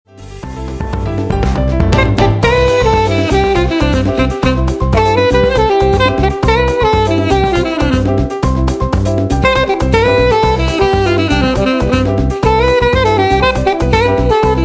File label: samba